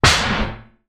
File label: foley tin lid drop on floor; zapsplat